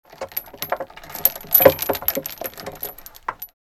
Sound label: Chains dragging on wood